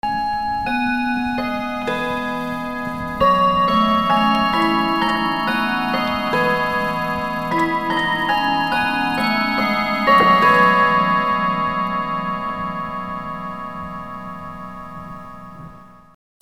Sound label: BELLS